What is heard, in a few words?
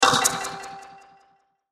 Burst Clap Harp Flange Delay; Production Element Imaging Element Accent Transition; Blastwave